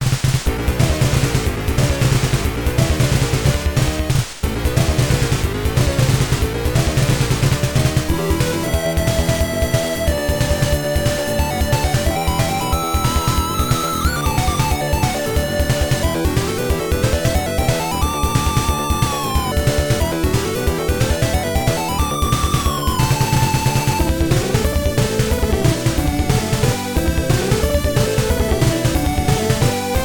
ボス戦 (FM音源版); Xak The Tower of Gazzel; MSX

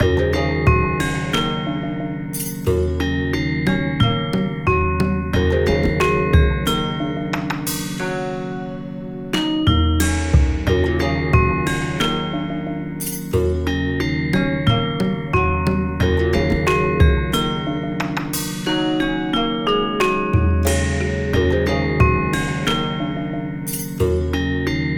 エスニックネガネガ Loop; Filmechanism

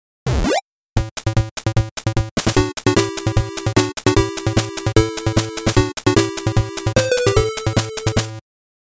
8bit スタート; ge cen love original soundtrack 2012

